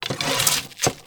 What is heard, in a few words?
arrow return to quiver, Medieval Weapons Sound Effects